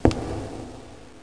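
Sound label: step ground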